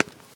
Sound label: stairs step; Golden Crayon Sounds